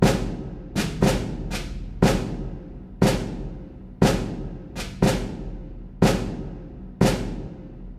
Camp Drum Loop

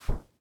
throw; teardown; ps5 windows xbox series xs

